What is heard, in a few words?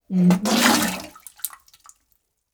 vomiting; human